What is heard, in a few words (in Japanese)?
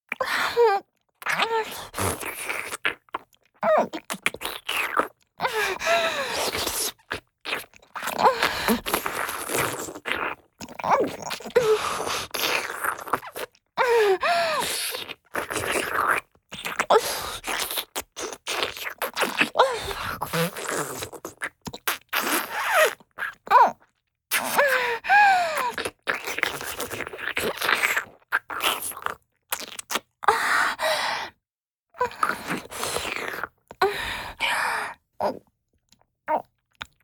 キス(強)：舌を絡めたり歯や歯茎を舐めあうディープキス